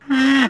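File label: rhino black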